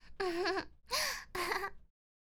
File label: Girl Laughing